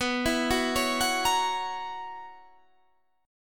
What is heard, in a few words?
B Major 7th Suspended 2nd Suspended 4th B down Guitar Standard; arpeggiated; JGuitar